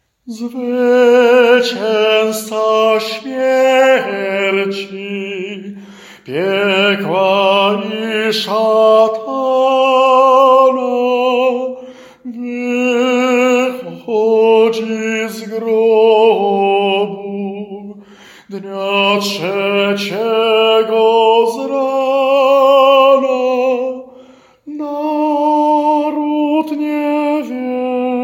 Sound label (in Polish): TENOR; Zwyciezca smierci